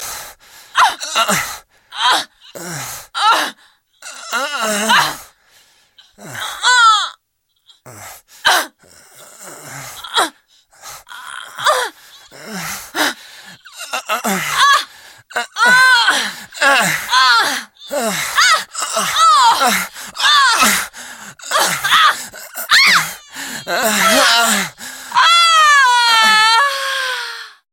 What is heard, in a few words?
big sound effect